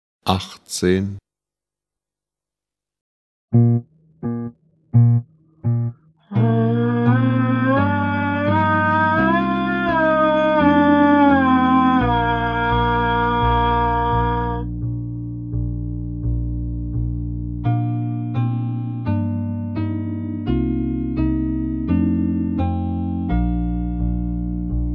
Klang, Hornschule

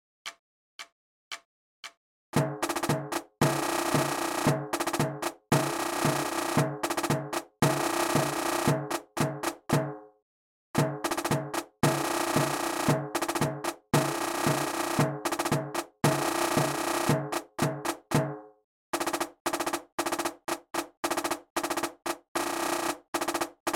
Perkussion

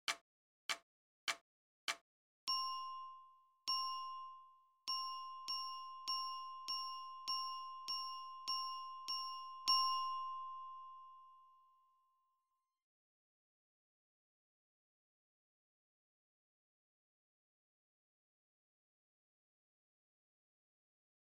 Lyra; Wilhelm Tell

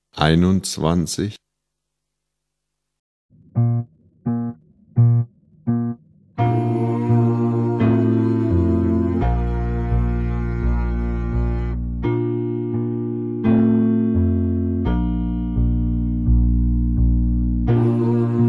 Klang, Hornschule

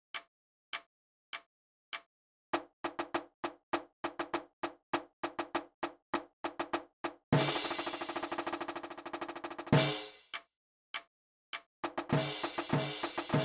Rhythmus; Wilhelm Tell

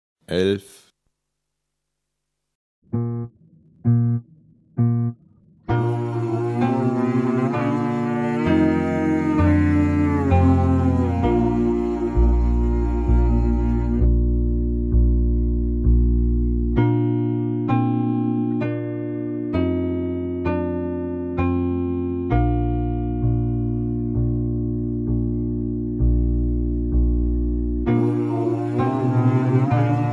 Klang, Hornschule

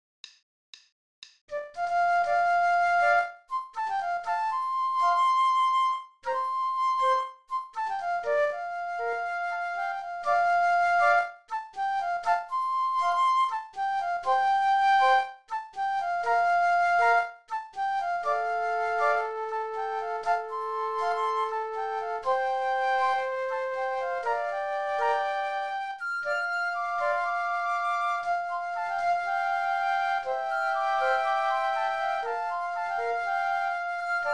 Timber - Floeten [120]

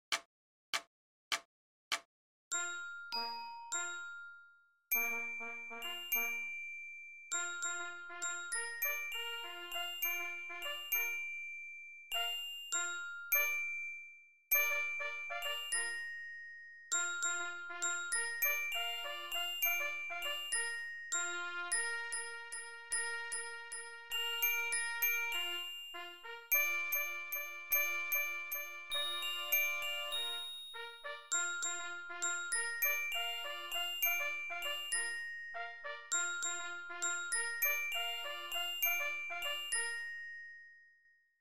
Lyra

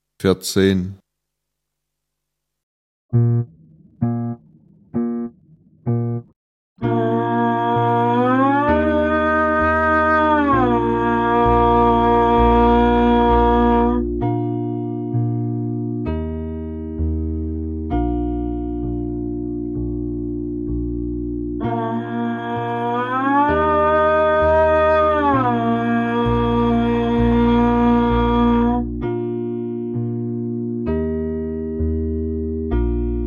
Klang, Hornschule

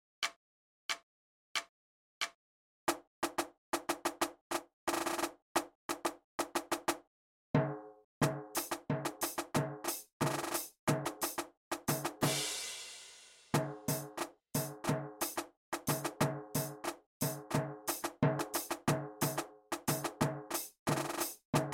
Perkussion

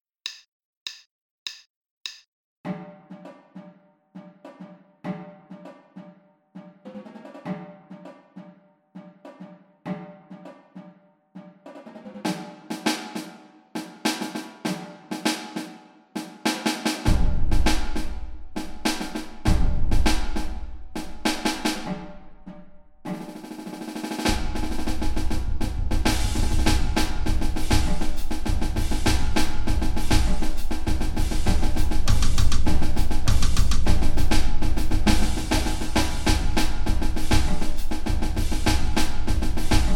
Trommel

